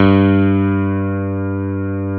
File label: CP70